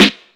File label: short snare drum sample F sharp key, Royality free steel snare drum tuned to the F# note